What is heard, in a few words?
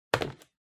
Book (Put Down)